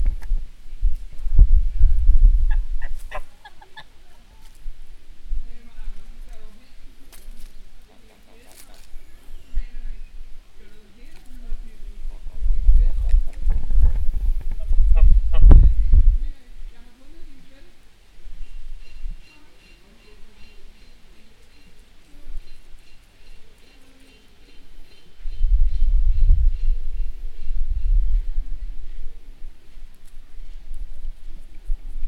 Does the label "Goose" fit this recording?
Yes